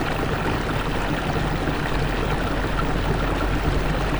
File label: STREAM FOUNTAIN Underground Weak loop; mono; mr future ninja